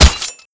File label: knife stab